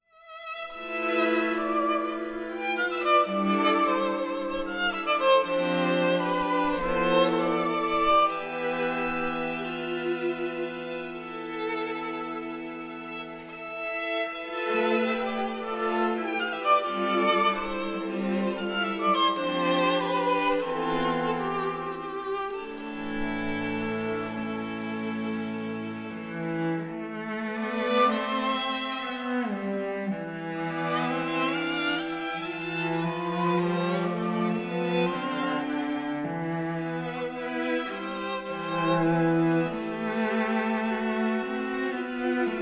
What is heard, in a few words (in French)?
Quatuor a cordes; Choveaux; O meu irmao (libre, comme une plainte)